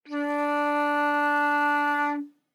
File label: Flute nonvib ff D4 stereo; Woodwinds